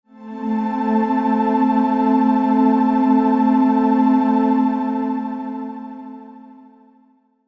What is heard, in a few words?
Logo Swell Tonal Soft; Sonic Logo Sound Effects; Production Element Imaging Element Accent Transition